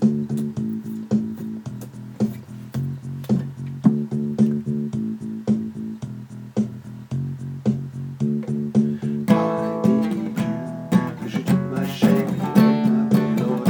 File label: I need you with drums bass